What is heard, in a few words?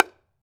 H-BONGO MH; AKAI S-Series CD-ROM Sound Library VOL-5